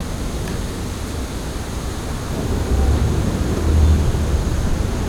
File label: city loop